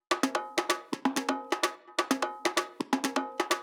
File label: Drum Loop 128BPM Perc; VEC5 Drumloops; VEC5 Multi Loops 128BPM; Loop Elements